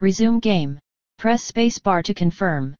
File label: menu; DeadFright